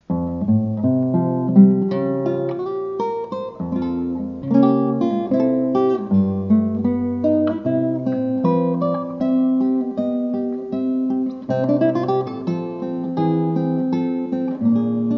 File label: Guitar